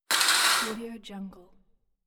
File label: curtains quick opening